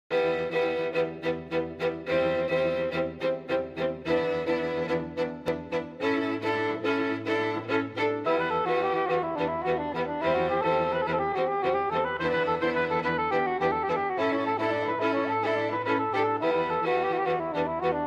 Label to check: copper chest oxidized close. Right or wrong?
Wrong